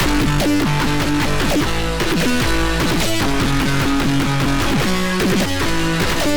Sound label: Dubstep